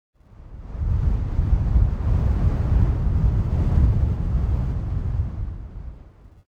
bass wind gust; ambience; Soldier Of Fortune II Double Helix; XBOX; kamchatka

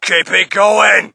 crowd, shout; Mike Tyson Heavyweight Boxing

